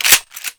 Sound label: Grenade Launcher Reload; Grineer; Weapons